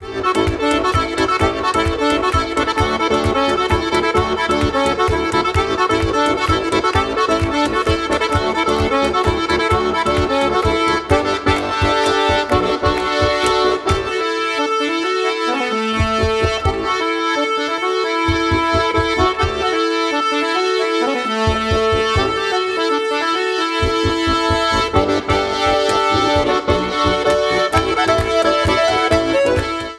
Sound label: Irish dance, Steirische Harmonika